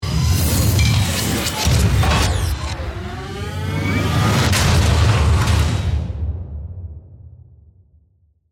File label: Mechanical Morph, Parts, Swirl, Digital, Transforming, Robot, Production Element, Imaging Element, Accent, Transition; Spacecraft